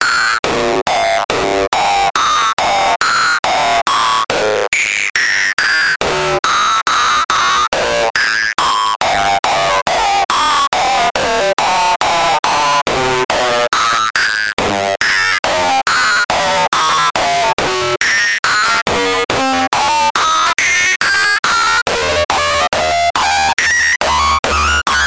Kicks

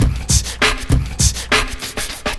Drum loop 100 Bpm Breathing Beatbox

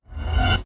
Scary Whoosh

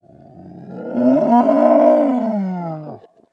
Brontosaur Whirr

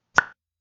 Bubble Pop

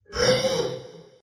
Shock

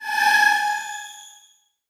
Screamer

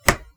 Clack

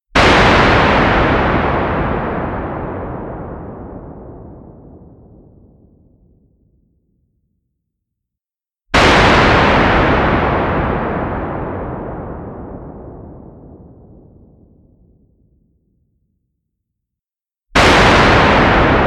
Naturalistic Synthetic Explosions

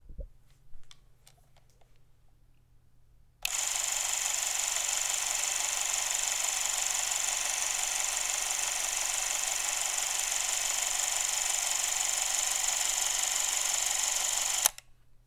Super8 Speed And Zoom

